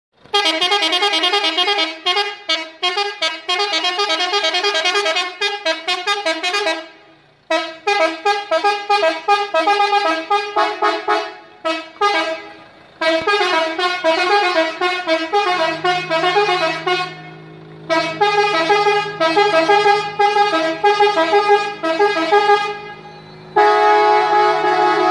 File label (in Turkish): disko disco ciftetelli yeni nesil arap korna